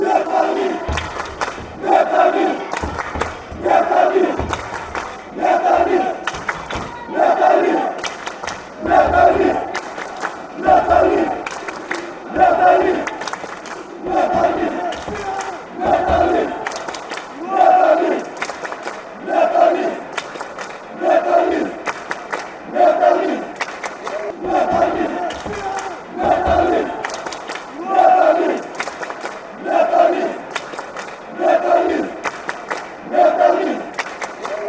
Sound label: chant, fanchants, metalist